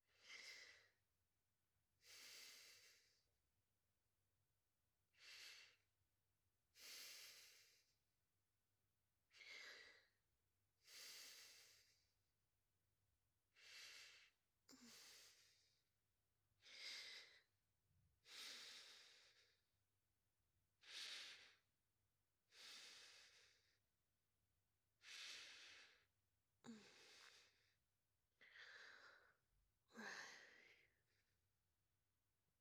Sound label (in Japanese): 寝息; SEあり